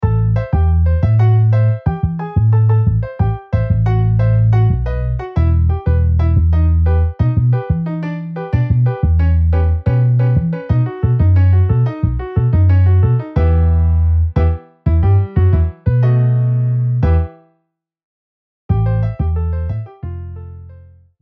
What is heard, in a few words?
Piano Bass MIDI